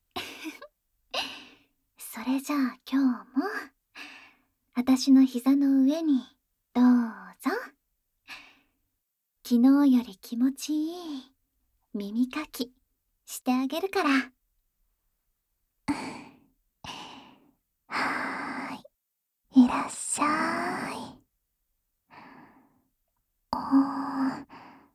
甘々耳かき（耳かき本体のみ)(囁きブースト効果音大きめ); サキュバス×２の甘々耳かき; 耳かき音片方のみ; ASMR

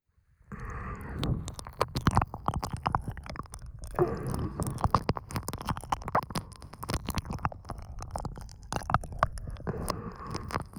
耳舐め（左）舔耳

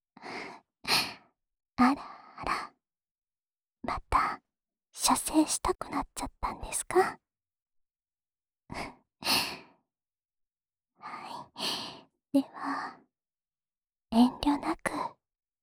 オナサポ用耳舐め（右耳）, ASMR

